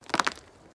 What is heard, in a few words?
Tree Fall